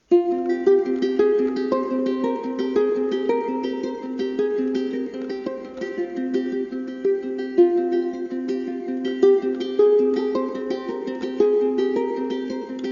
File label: Uke; Suspiria